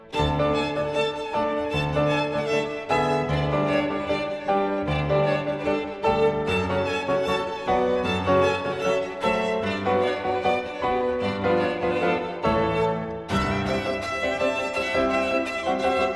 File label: Romanian Folk Dances, Quick Dance- Allegro